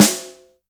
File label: short reverb steel snare drum sound G sharp key